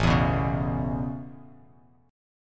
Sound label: A Major Add 9th A Bass Standard, chord; Aadd9 strummed; JGuitar